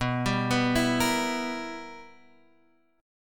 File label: B Major 7th Suspended 4th Sharp 5th B down